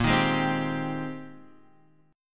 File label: Bb 13th Suspended 4th; Bb13sus strummed